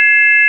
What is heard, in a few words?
sine; ものづくり基礎工学（情報工学分野）; 音の波形と分析